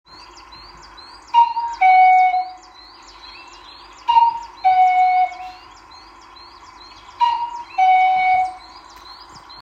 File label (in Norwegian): Gjoekfloyte